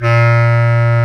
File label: WND BS CLAR, Bs Clarinet, Orchestral Winds